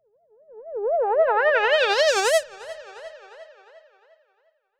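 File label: alarm siren, filter processed, 170bpm; musicradar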